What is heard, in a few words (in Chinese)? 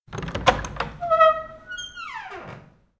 开第一种门; 环境音; 非循环音(SE); Eustia